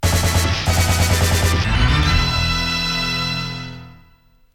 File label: Pattern Clear; Contra III